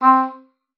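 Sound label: C4, oboe d'amore; Xsample VOL-7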